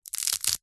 cracker snap crunch break; food; zapsplat

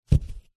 foley foam board set down on hard surface; zapsplat

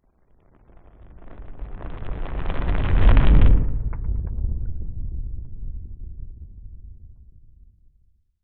nature fireball pass by large designed

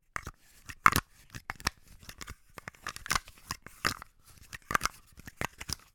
toy lego duplo bricks clipping together removing

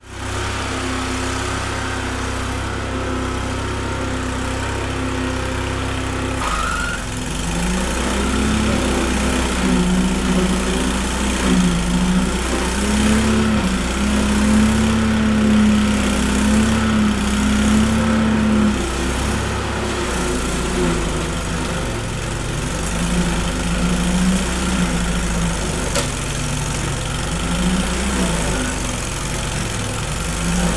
industrial cultivator petrol operating

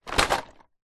plastic hollow large fall over drop; foley

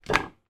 food tortilla wrap put down on plate

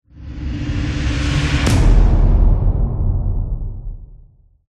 CINEMATIC HIT Heavy Drums Long; smartsound

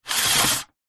industrial joist metal small sliding extend

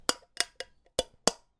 foley food tin can lid opened movements

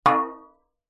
impact metal light hit cooking tin water inside

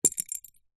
foley whistle plastic toy drop carpet

